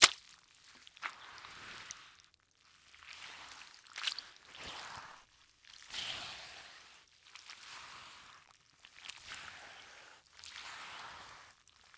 Liquid Water Mop Wet Mopping Concrete Floor Slowly